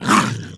attack; allods2d

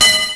PIPE; Easy Personal Web Page

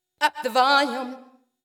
VOICE GRL HOUSE 125 A, House Voice

